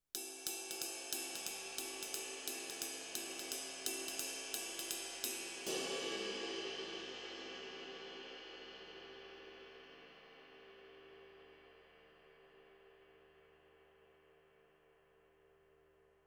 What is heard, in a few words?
22 RIDE JAZZ, cymbals